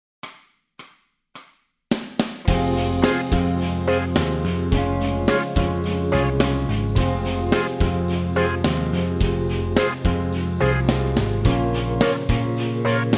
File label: guitar scales, backing tracks